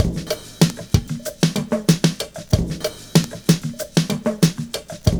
MIAMI VIBE; Univers Sons - Kitch 70s

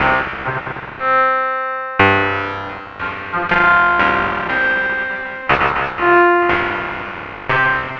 Synth, Synth SFX; Sonic Foundry (Sony Creative Software); The Electronic Music Manuscript Collection

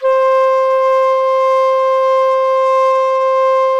TRAV C5, traverse; SoundBytes - Early Patches

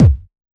OSH Kick

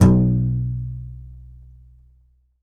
C. Bass Balalaika, Plucked Strings

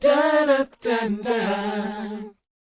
DOWOP D#; ILIO; Vocal Planet

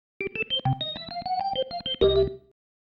Single Riff, Organ; Loops; Single Riffs; Sonic Foundry (Sony Creative Software); Joe Vitale Organ Donor

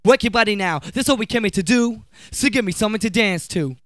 VOX SUNG; Megabass Remix! 2

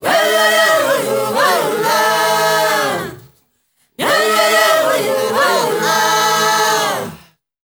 WEDDING SO, MIX. CHOIR; Spectrasonics; Heart of Africa 2

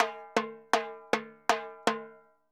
Timbaleta Poly, Drums & Percussion; Best Service; Festa Latina VOL-1

